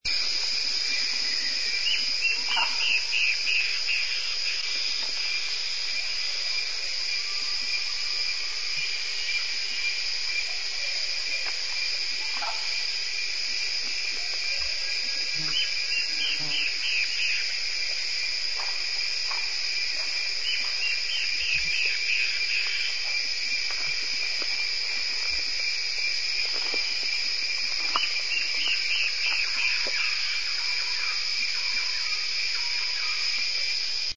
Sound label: warbling antbird; Peru